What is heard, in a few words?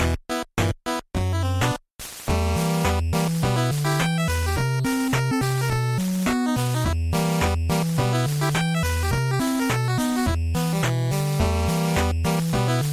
8-Bit; Bowser Land